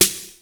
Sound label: NOIZZY SD; Crypton - DrumBase5000; DANCE3+TB303